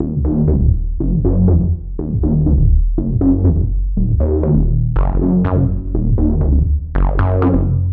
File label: Even Tine; KJ Sawka; Drum n Bass & Beyond